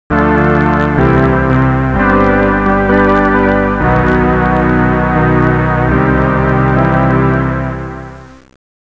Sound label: ORGAN, Siel DK-600